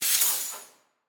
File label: Semi Truck; Large Vehicles; Blastwave